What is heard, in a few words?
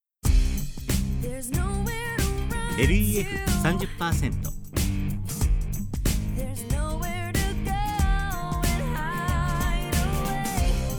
vocal